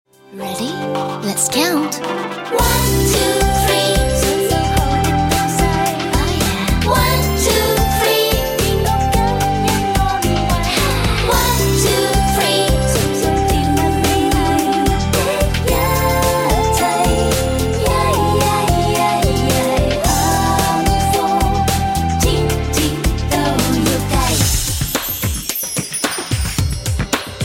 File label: Karaoke